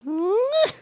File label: breakdance woo rising, emotes